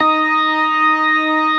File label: STOPS, ORGAN